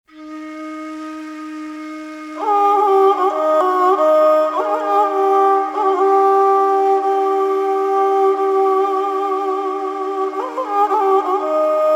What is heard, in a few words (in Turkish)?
Ney Kemence Taksimi